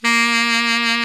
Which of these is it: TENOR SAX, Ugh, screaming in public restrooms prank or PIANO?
TENOR SAX